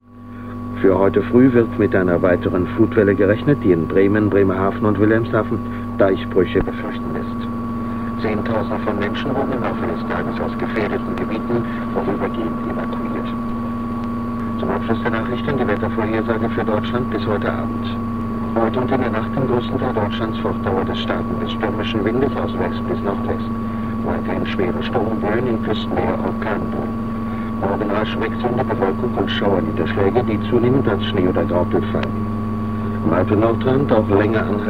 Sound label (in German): DLF Langwelle